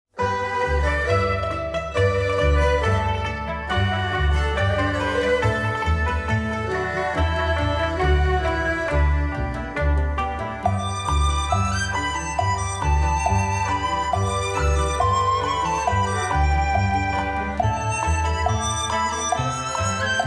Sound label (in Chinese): 循环音乐 酷 喜相逢新年音效